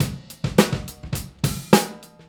Straight Kit, World Pop Beats

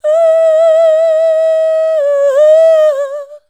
UUUH, SHOUTS; Best Service; Hyper Dance

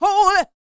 D BIT, Vocal R&B Dance

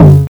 TOM, Drums Dance & Groove; USB Soundscan vol.11; AKAI

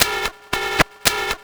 CYBER ALARM, CYBER TOYS; Spectrasonic Distorted Reality 1

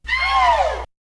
Vocal; ZERO G - Datafile DISC 1

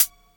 CHH, Dance Drums

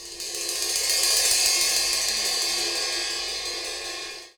rev cymbal; Ueberschall; Techno Trance Essentials; DRUMS

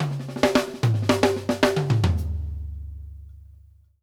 off toms, drum fills

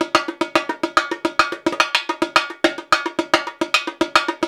108 Rio Batucada; Spectrasonics; Supreme Beats; World Dance

